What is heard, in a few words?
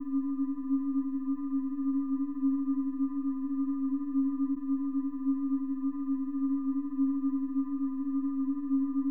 Ambience Loop